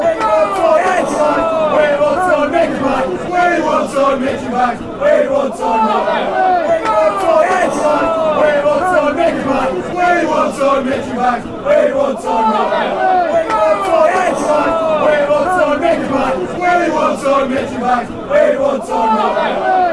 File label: blackburn chant